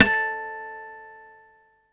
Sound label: guitar steel; Best Service ProSamples vol.55 - Retro Sampler; AKAI